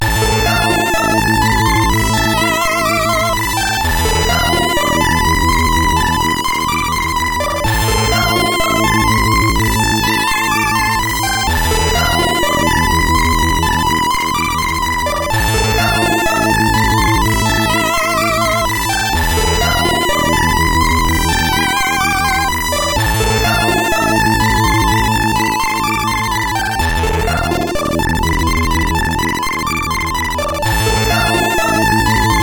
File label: X-Music Remix, CSG8580